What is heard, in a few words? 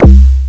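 bass drum, trash kit; Drums Dance & Groove; USB Soundscan vol.11; AKAI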